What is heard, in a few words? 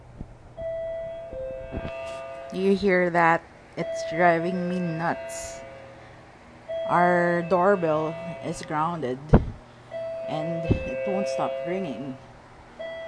grounded doorbell